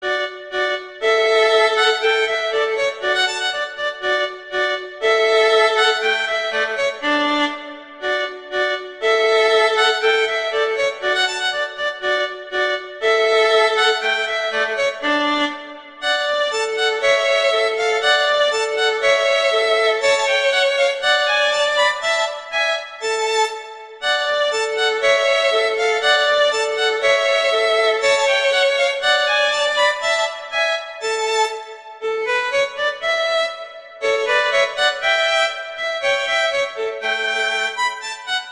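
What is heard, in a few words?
Duet, horn; Mozart Duets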